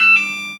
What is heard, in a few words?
beep